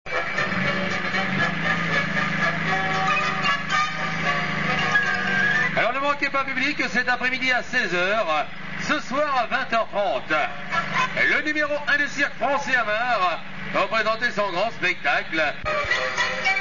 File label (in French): ambiance annonce cirque